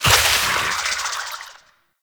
Loot Tree Open Burst; Ambience; Warframe; Infested Eris

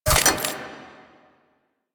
Shotgun Mech; Warframe; Tenno; Lotus Pod Shotgun

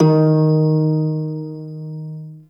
Prime Shawzin Oct One D Sharp; Warframe

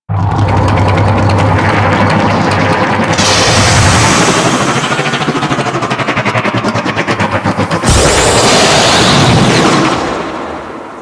Passenger Shuttle Drop A; Warframe; Ambience; Space Battles

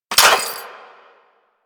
Fire Sweetner, Weapons; Warframe; Gorgon

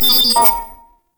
Flying Robot Beep; Quests; Veilbreaker; Ambience; Kahl Quest

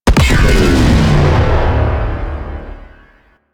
Glaive Detonate Explosion Large; Warframe; Weapons; Ghost Catcher

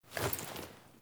Foley Action Dodge; Characters; Player

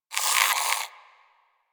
Rodent Vocal Snore; Warframe; Hunting Critters

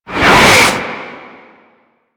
Tank Cannon Projectile Whiz; Enemies; Weapons; Warframe; Lasrian

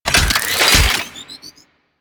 Cart Turret Deploy; Warframe; Venus; Venus Turrets

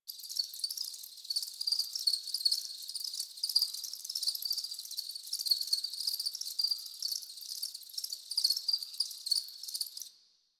Night Insects High Loop D; Ambience; Props; Loops; Warframe; Eidolon Plains

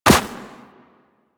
Run Snow, Footsteps; Warframe; Operator

